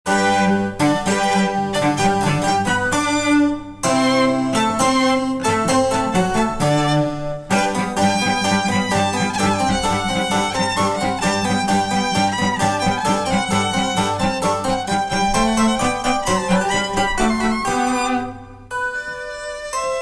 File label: Palm Court Trio piano cello and violin